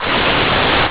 cut torch

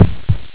heart

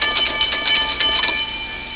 bellring

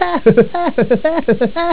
inane laughter

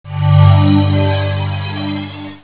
robot start

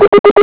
blips

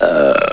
burrrrrp

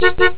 beepey beep

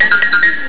empty

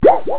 wop

